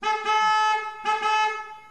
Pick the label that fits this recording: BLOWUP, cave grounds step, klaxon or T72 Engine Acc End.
klaxon